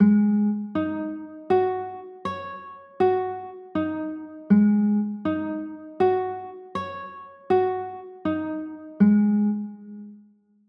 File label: a flat 7sus4, chords